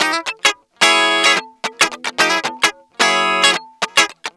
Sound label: Guitar Loop; Ambient Grooves